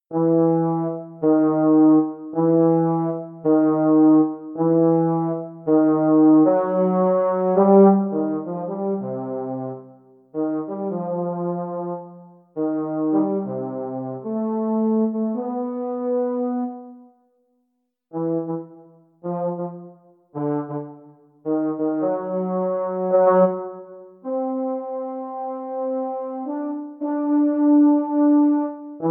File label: baritone; holiday brass